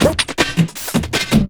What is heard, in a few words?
Drum & Bass, 160 BPM